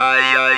TB AYAY, Talk Box

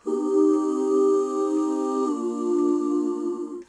vox; Zero G; Man Machine

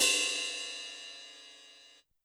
Ride Cym Brush, YAMAHA RM1X

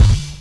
BD, Dark Side Of TripHop

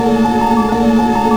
Control Loop; M8

